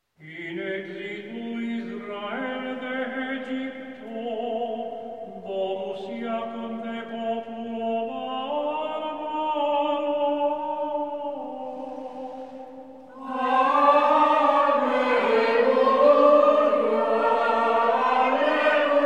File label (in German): Ambrosianischer Choral - Antiphona; In exitu Israel